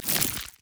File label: human idle recall; Elise